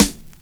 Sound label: SD, snare, 70 s Breakbeats; USB Soundscan vol.46; AKAI